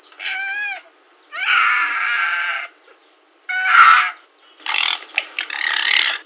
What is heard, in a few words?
Vulpes vulpes aggressive; Red fox; Aggressive calls of subadults during food sharing out